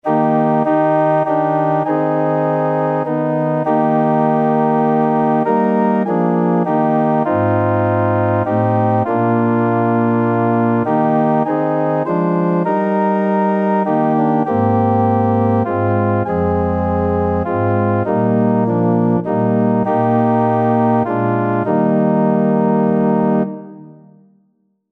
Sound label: ORG, organ